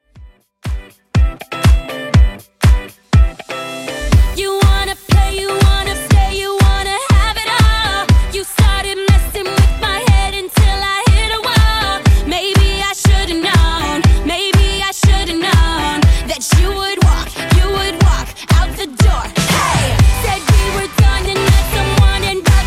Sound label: Top40 DJ Friendly Redrum 121bpm